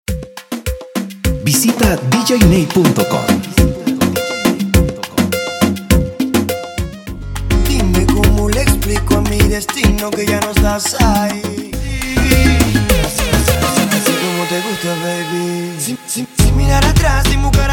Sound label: Merengue Mashup Salsa